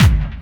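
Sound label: hard bass drums; Bazzdrumz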